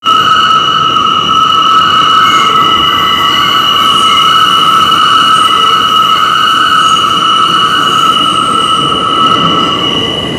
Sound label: flange, Train; R142; OpenBVE; Jerome Yard